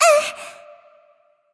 grunt hurt; smite; scylla

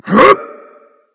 grunt jump berserk; cuchulainn

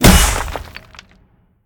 pwr hit stun object; smite; gilgamesh

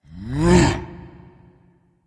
grunt; smite; geb

